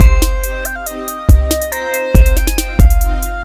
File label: victory music; Smite; god Kuzenbo v2